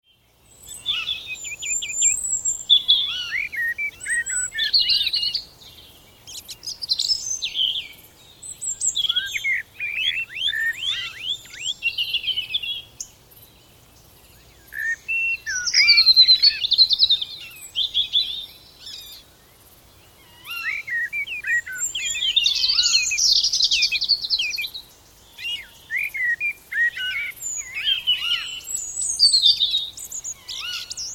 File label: Birds Early Morning, Woodland birds with owl background; Animals; Tom Hutchings